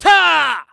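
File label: Mon Man ATTACK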